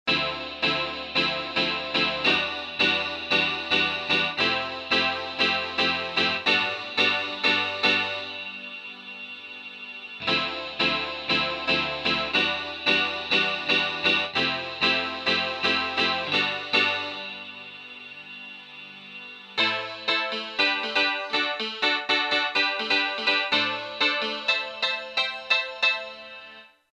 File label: Odd Piano; Roland M-DC1